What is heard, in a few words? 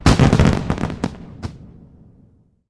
fireworks spark; ambient; pvkii